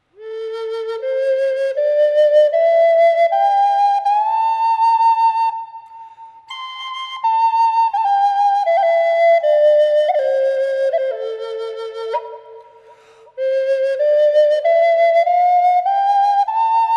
staghorn sumac a wet, flutes

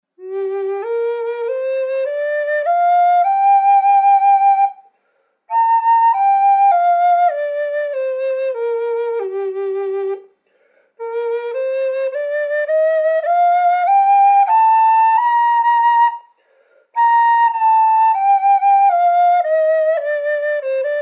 Gm spalted maple dry, flutes; spalted maple burl

